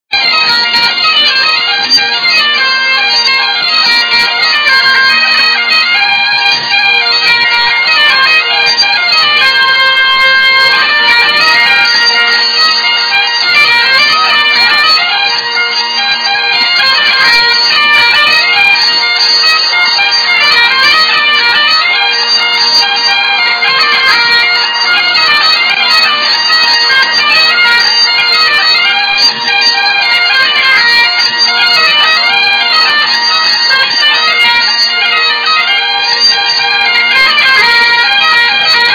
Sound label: bagpipes